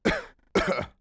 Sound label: bag; Mafie 1